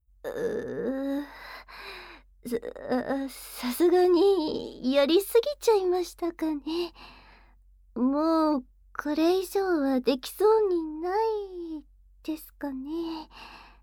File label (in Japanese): 連続アクメ＆潮吹き; KU100